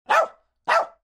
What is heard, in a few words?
dog bark bow bow